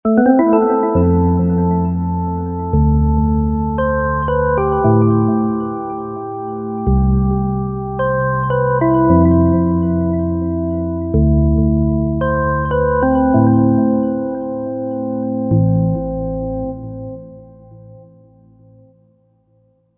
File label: Roland JV-2080 Techno Dream